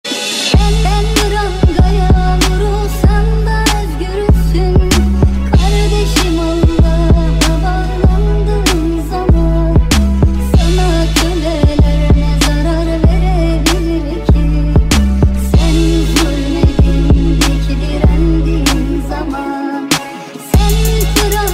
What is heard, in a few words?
Beats